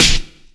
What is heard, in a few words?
airy acoustic snare C sharp key